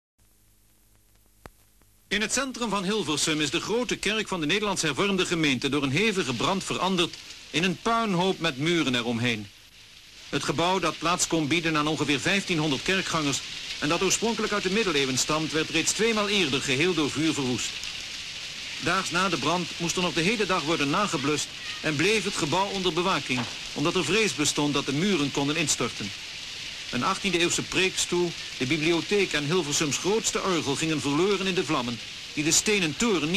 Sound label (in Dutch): Kerkbrand